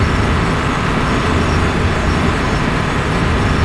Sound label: engine; Star Wars Knights Of The Old Republic II; XBOX